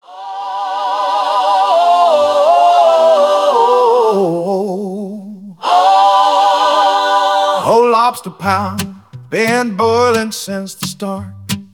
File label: instore loop; fox s lobster house